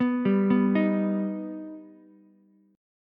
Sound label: Eb Augmented Eb, Ukulele; Eb+ arpeggiated; JGuitar